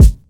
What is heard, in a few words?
dry kick drum g sharp key, bass drum sound tuned to the G# note. Loudest frequency: 319Hz